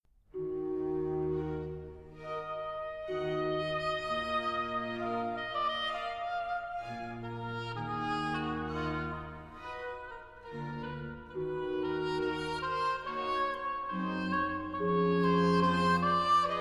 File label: oboe; Bach Markuspassion; Welt und Himmel